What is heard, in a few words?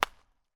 sport baseball hit; martinimeniscus